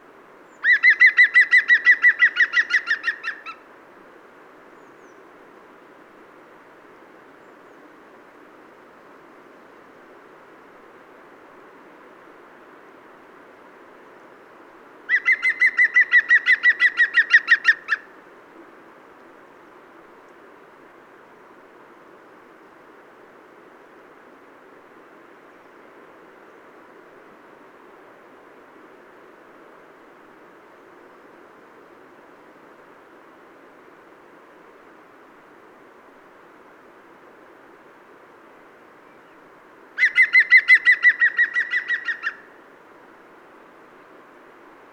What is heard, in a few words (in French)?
pic vert chant